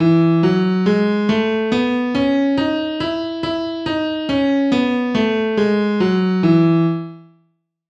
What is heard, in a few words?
E major piano